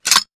weapon foley drop; Stereo; Real Guns Sound Pack 2

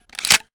weapon foley drop; Stereo; Real Guns Sound Pack 2

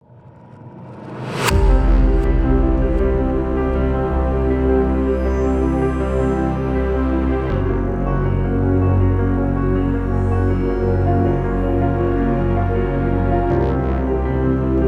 lobby music

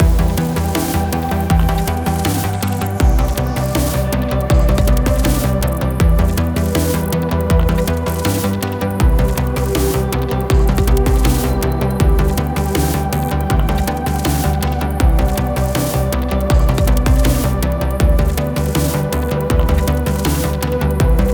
Space Station (loop); Music

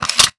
weapon foley drop; Stereo; Real Guns Sound Pack 2

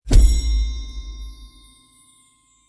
ranked start

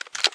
mag eject

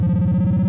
boost step

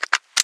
new mag

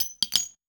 weapon ammo drop; Stereo; Real Guns Sound Pack 2

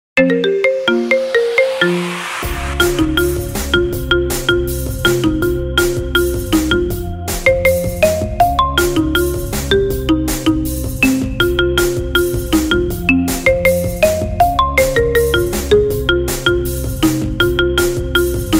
happy marimba; musics

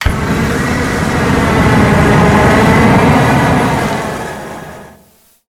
flamethrower shot; Mono; Weapons; Real Guns Sound Pack 2

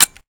revolver empty; Stereo; Weapons; Real Guns Sound Pack 2

